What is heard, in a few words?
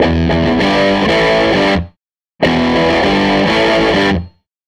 SG Guitar Loop